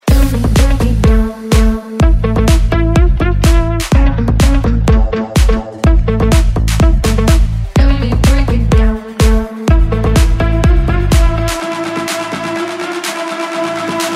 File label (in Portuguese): sound car especial mix